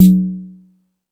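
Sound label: Jungle Percussion, Drum Machines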